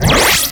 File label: Laser Rifle Stop; Warframe; Crp Laser Rifle